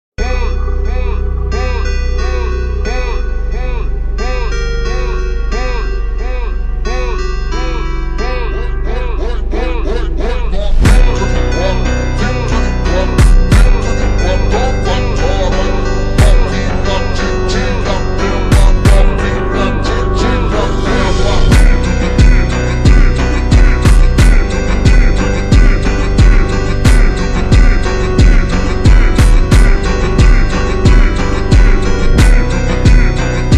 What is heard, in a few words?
Phonk over Slowed Reverb